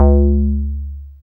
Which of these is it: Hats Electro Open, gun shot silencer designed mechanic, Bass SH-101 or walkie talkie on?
Bass SH-101